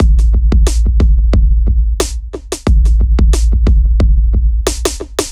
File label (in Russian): Machine Beats, электро-джаз